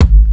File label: KIK